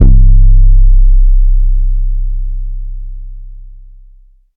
phonk kicks oneshot 808 c; kick drum

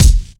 good kick drum sound f key; kick drum samples

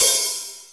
perfect open high hat sample f sharp key

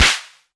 reverb clap sound clip e key; percussion; single hits one shots

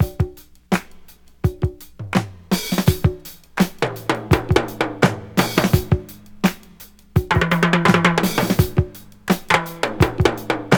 84 bpm modern breakbeat f sharp key

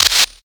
original clap single hit b key; single hits one shots

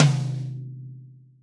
high tome drum sample c key

